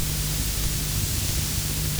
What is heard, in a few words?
Noise; Ableton Live 10 Suite